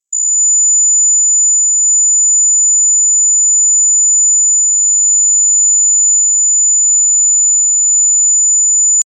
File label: warning loud pitch